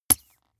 bath duck hit

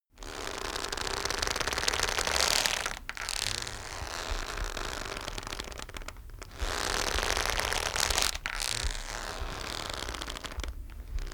leather leather boot creak

